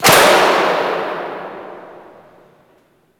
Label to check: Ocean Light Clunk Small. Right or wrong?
Right